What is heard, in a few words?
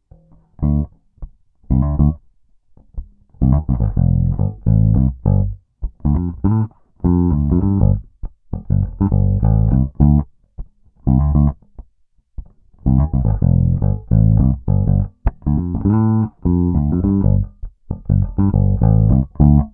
Peavey Stock Neck Fingerstyle Tone DOWN; peavey shootout audio samples